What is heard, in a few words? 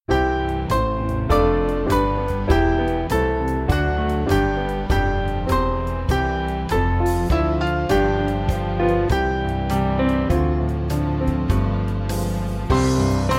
Strengthen For Service, Band; Small Band; Burg